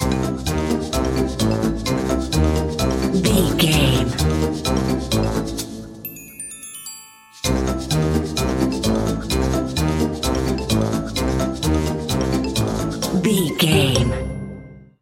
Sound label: wacky inventor